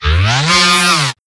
Synth Roll

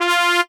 Brass; pitchglitch samples; Ernestom81

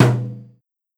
Tom High

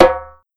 Perc Djembe Slap; Circ Afrique V4

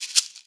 Shaker

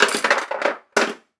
Cutlery

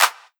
Clap; pitchglitch samples; FutureSheets

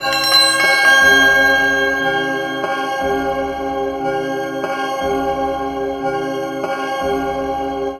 Various Bells 120

